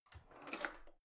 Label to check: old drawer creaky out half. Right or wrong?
Right